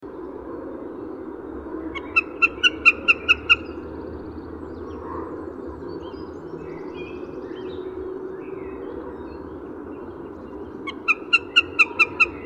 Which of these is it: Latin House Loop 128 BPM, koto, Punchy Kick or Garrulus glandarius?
Garrulus glandarius